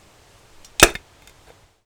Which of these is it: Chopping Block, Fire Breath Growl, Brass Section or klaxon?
Chopping Block